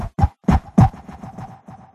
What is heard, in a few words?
Jungle Monkey; And Yet It Moves